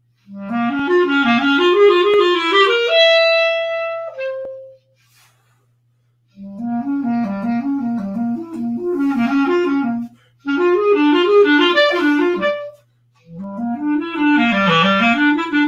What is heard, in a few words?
Rolling Sound, clarinet